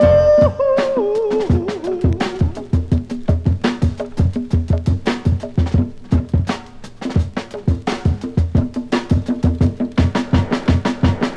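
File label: 84 bpm high quality drum beat d key, kick tuned to the D note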